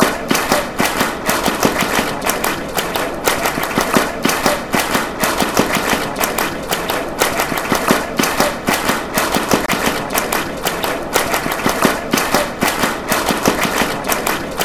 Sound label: claps, fanchants